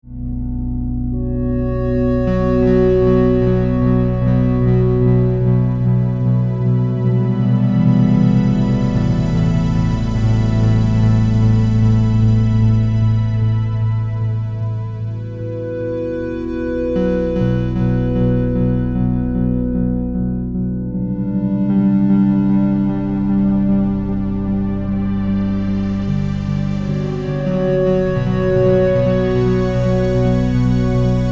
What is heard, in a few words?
oberheim xpander